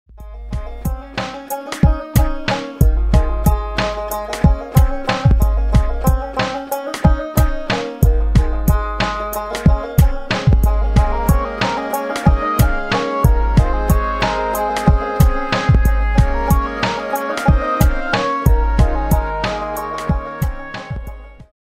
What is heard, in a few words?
Banjo on my thigh, song clip